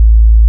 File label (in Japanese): 55Hz, 小さな低い音